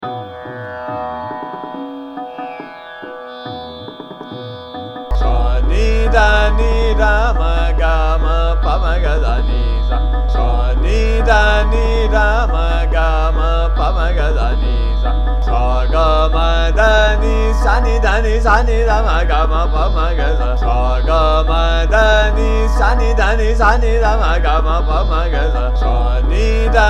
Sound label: Sargam, Sa Ni Dha Ni Dha Ma Ga Ma, Ektaal Drut; Pancham Jogeshwari